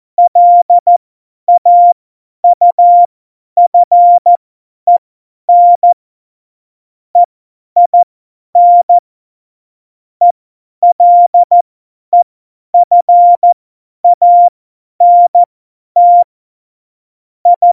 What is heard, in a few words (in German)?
Witz, Morsekurs, 50 F70; Witze